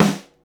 Smooth Steel Snare Drum Sample G Key, steel snare drum sound tuned to the G note